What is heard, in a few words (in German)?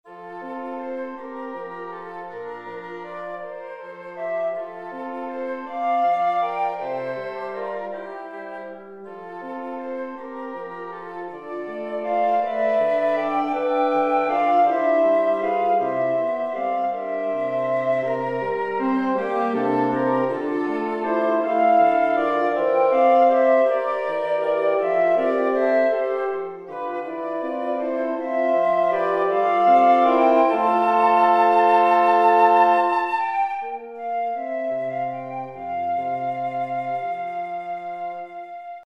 blaeserquintett